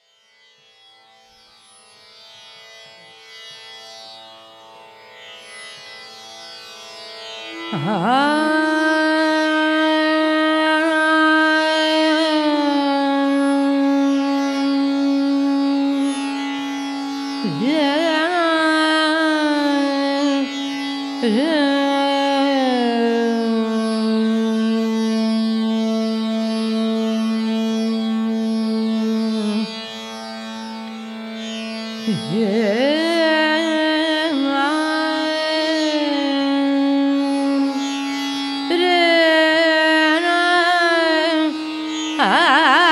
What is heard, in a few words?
raga basant alap